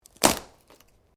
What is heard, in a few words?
Branches Dead Snap